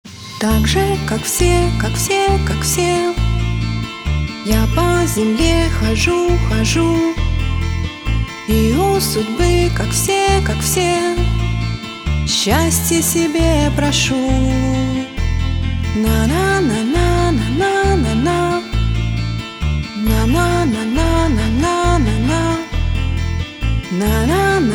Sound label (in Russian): Zapis vokala